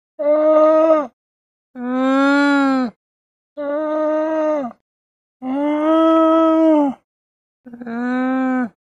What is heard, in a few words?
Llama Calls. Two Llamas, Multiple Calls Back And Fort; Llama Calls. Two Llamas, Multiple Calls Back And Forth. Close Perspective.